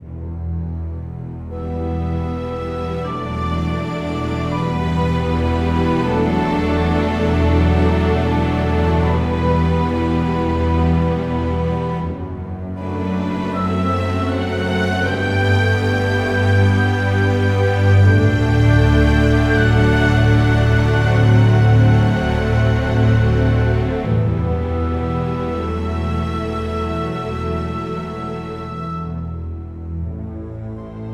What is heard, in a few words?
Orchestral Ambient; Orchestral Ambient Music Pack